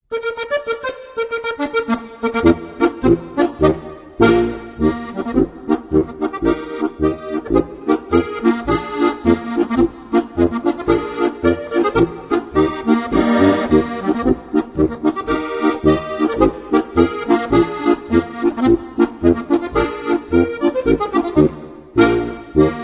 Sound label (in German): polka